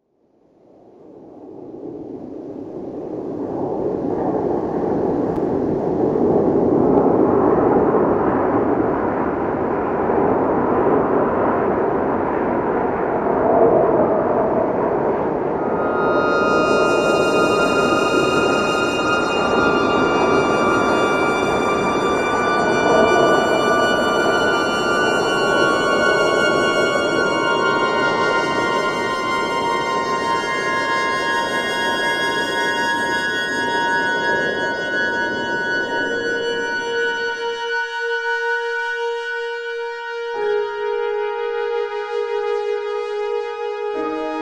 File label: wtc church